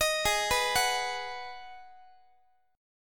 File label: Ab Minor 7th Ab, Guitar; arpeggiated; 4A,4E,5A,5D,5G#,6C#; x,x,18,18,15,17